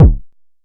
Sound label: analog attacked, Kickbank